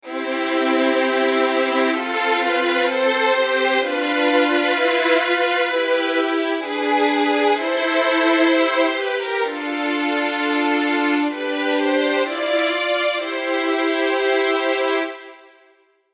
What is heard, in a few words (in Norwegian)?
arr m variasjon kun stryk